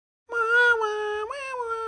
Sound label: grub sound; hollow knight